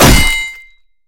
oglushitelnij zvon pri udare mechom

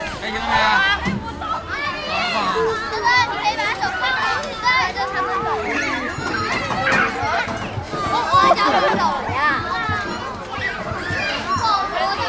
park deti vzroslie oborudovanie

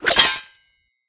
metallicheskij skrip zamaha zheleznoj strunoj

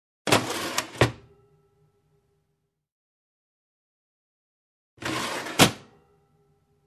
duhovoj shkaf dveri otkrivayut zakrivayut

